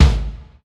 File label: thick kick single hit g sharp key; kick drum samples